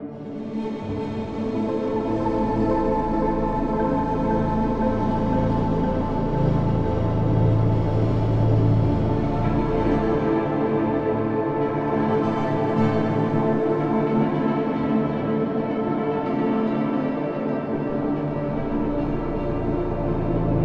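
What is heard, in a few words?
Lab Rat DRONE, video game soundtrack; escape academy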